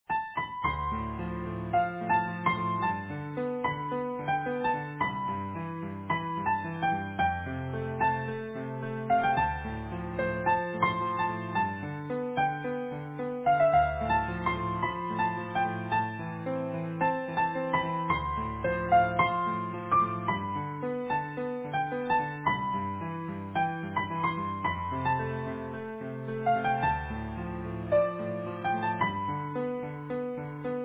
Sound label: Easy Piano